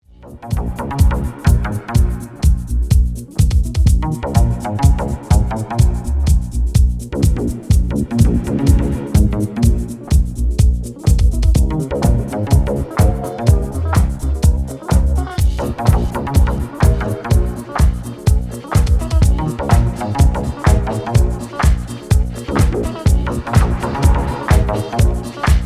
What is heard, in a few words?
Wonky Bassline Disco Banger